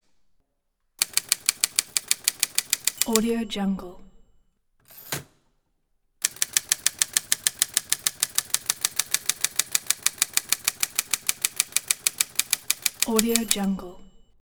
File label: typewriter